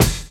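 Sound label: drum chop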